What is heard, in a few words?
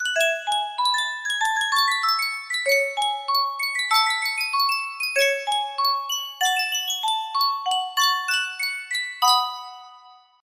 Sankyo miniature music box